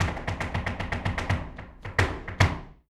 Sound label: Flamenco, Tapping, Zapateado Solo